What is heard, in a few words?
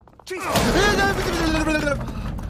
raven gibberish